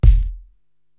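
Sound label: bass drum Big Box, Korg TR-Rack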